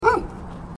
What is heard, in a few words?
dog